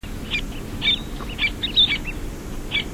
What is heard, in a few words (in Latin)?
sterna albifrons